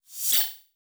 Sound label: fast sci fi transition sweep